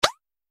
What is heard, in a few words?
Platform Jump